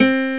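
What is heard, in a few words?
nylon guitar; gmplay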